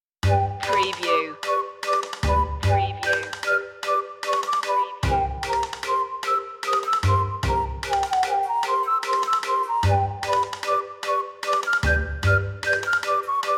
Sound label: little birds no xylo no flute less perc